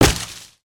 Generic On Hit With Effect; creatures organic

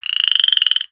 Frog Hatchling Croak; creatures organic

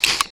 brickmove; build mode

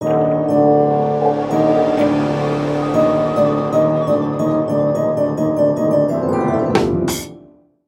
binocular shark, ambience avant gardens

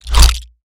multibrick whoosh; build mode

